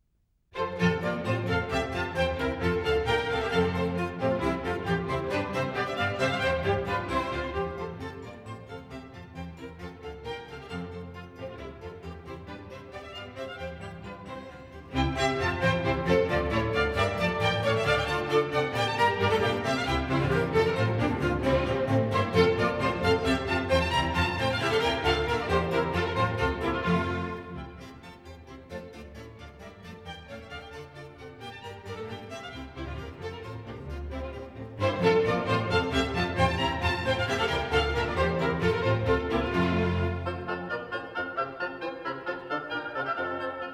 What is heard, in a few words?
Bourree